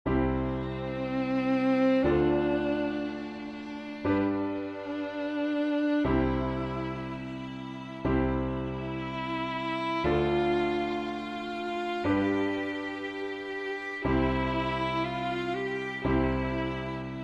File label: Violin; Som Prava cesta